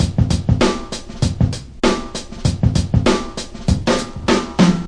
98 bpm rolling double groove, drum loops and breaks; 091 to 100 bpm